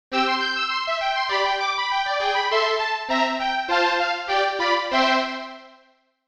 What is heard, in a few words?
Melody Violin, string machines; electrickeys